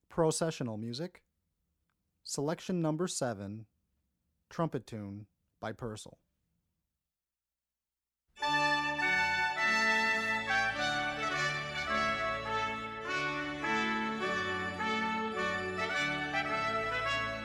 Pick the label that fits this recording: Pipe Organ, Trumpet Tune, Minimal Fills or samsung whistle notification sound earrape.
Trumpet Tune